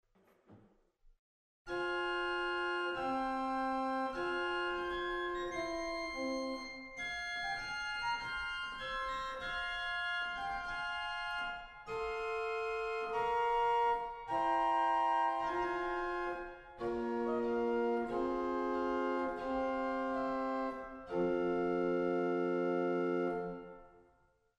Gemshorn 4; waltershausen

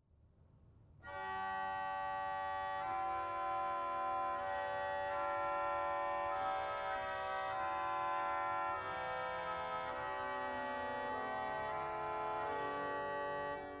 Gamba 8, organ; dortmund; Sauer

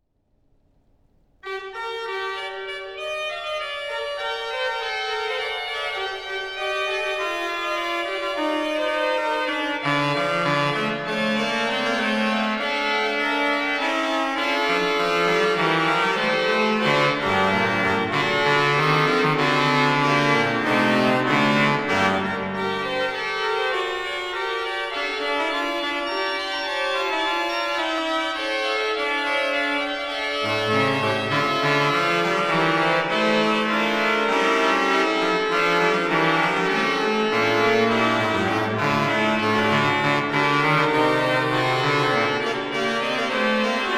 Clerambault Caprice, organ; frechilla

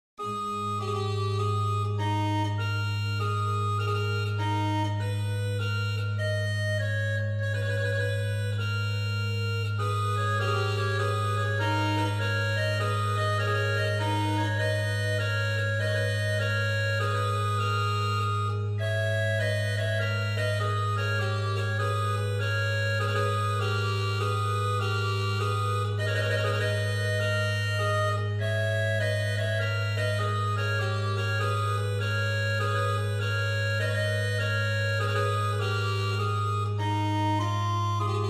Dandrieu Suite No. 6 Musette, organ; roquemaure

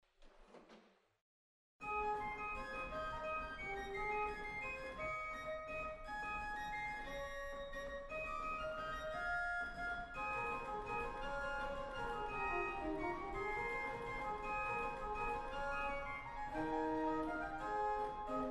Spitzfloete 4, organ; waltershausen

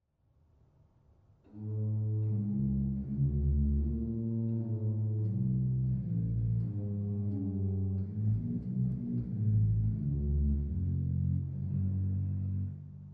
Principal 16, Sauer; dortmund

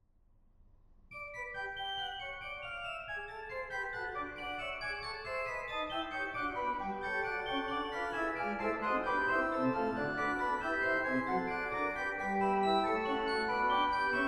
Leipziger Gigue KV574; W A Mozart

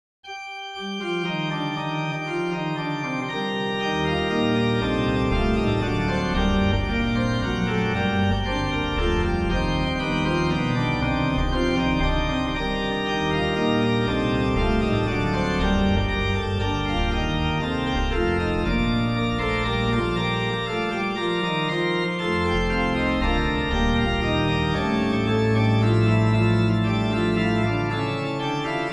Bach, organ; callido; BWV612

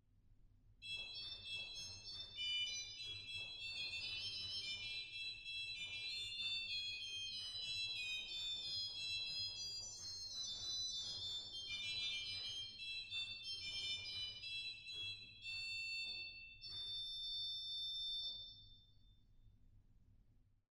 Cimbel, organ; stade

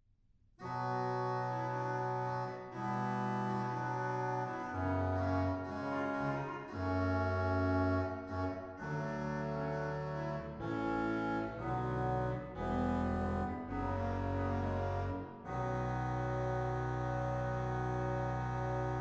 Dulcian 16, organ; stade

